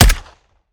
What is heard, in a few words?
sup plr; weapons; gmod; viper; scharlie